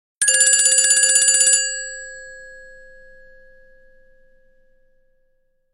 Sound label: Low bell, Nokia; 6700 slide